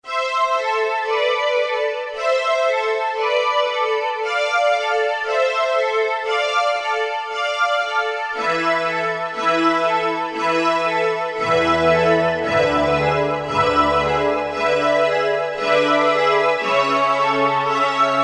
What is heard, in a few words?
Strings